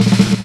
DRMROLL; E-Lab; X Static Goldmine 2; akai

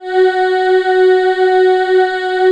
ATMO PADS; Best Service; XX Large - Pads

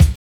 BD; E-Lab; X Static Goldmine 1

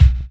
BDRUM; E-Lab; X Static Goldmine 2

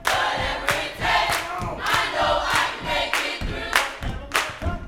YOUTH CH PHRASE, Vocal Planet Gospel

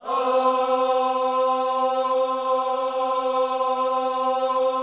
OHS C 4, Gospel; Spectrasonics; Vocal Planet Vol.1 Gospel; akai

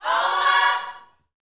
Vocal Gospel PHRASE

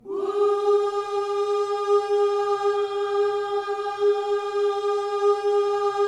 WHOO G#4, Vocal Planet Gospel; Spectrasonics; Vocal Planet Vol.1 Gospel; akai

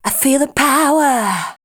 voice spectral, ly short

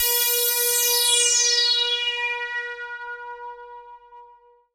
A PAD, ATMO PADS; Best Service; XX Large

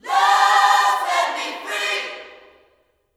Vocal Gospel MULTI PHRS

